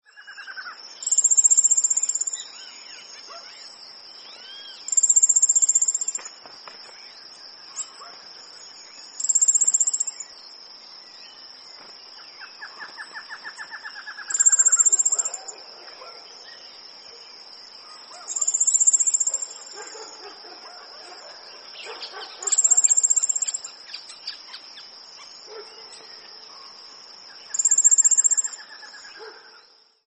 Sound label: Piculet